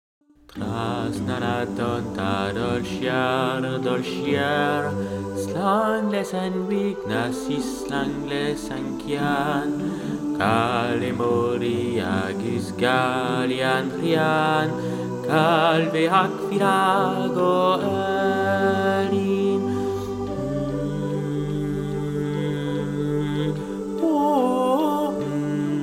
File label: trasna na dtonnta altos aigu